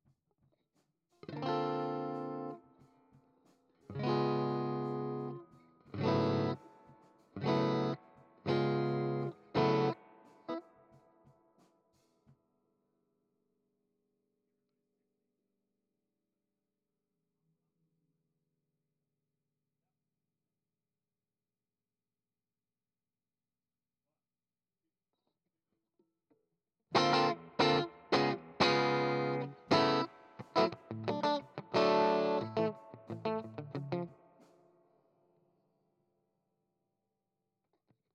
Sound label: GTR